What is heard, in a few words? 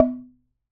Wooden Bar Strike